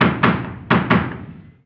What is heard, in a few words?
gun usassault med